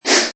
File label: Hover, Button sound; Multimedia